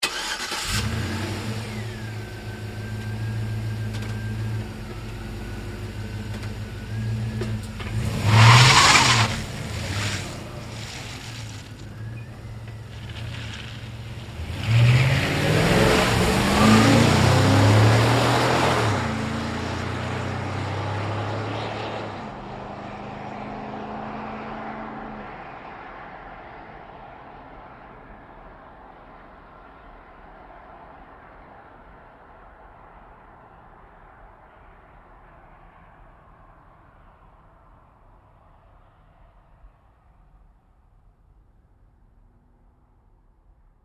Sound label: Truck GMC Savana Start Away Fast Edgy; 2010 GMC Savana; Trucks; Vehicles; Big Room Sound